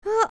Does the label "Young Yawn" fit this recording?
Yes